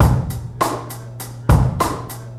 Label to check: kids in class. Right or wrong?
Wrong